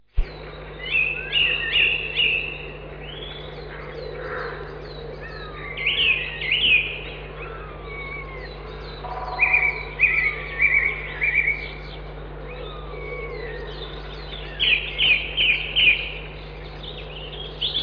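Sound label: song thrush; More repetitive than the Mistle, heard from December onwards, peaking in March/April.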